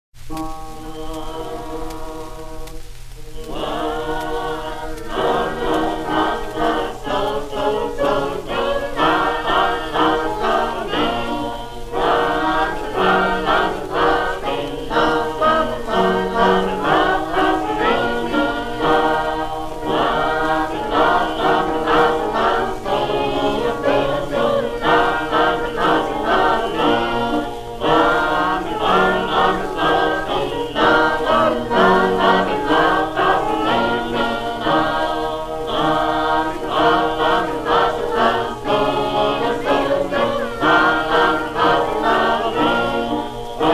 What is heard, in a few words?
sacred harp